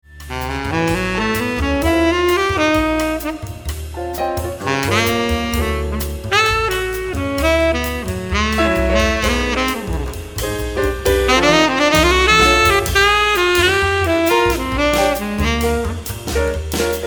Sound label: tenor